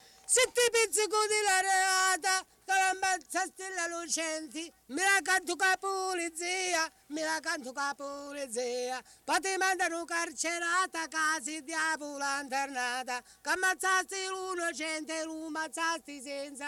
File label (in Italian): canto di sdegno; Cardeto; Mammola; Cinquefrondi